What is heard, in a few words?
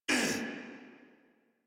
Pain Corrupted Grineer Male